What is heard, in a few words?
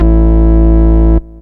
dance, bass; Techno Trance; Techno Trance Essentials